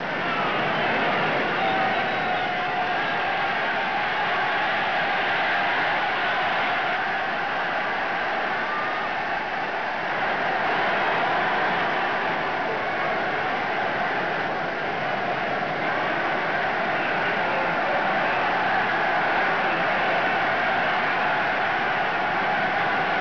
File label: crowd, football; bhop